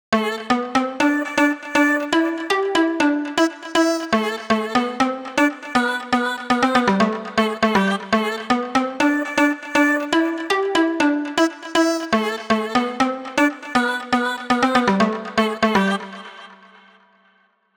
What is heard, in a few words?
Synth Am 120Bpm, House; Musical Loops; House Kit; Ghosthack; Advent Calendar